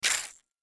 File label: Menu Game Menu In